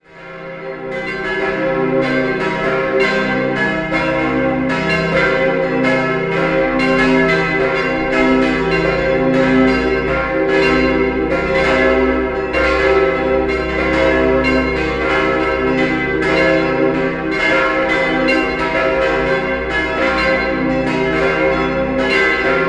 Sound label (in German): Bad Reichenhall Zeno, Glocken